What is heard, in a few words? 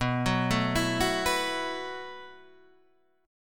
B 7th Suspended 4th B